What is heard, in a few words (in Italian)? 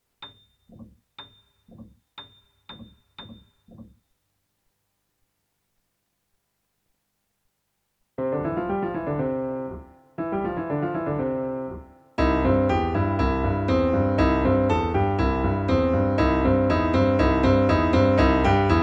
piano base